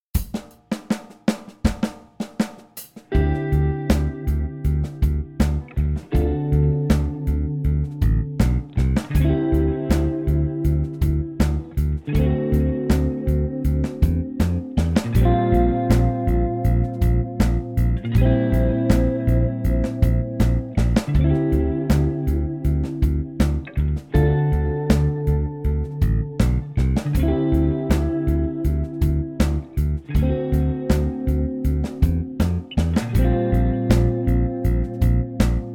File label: lick backing